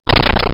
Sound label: brick break; tf; e-smario3